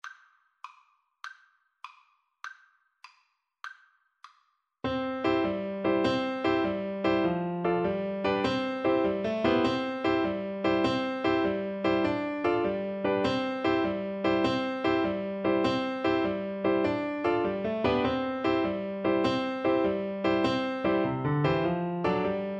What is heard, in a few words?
Alto Recorder; English Traditional